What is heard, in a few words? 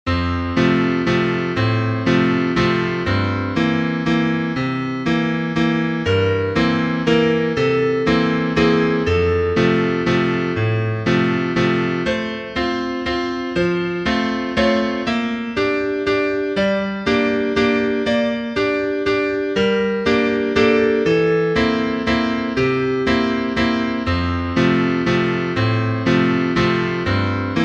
piano, sheet music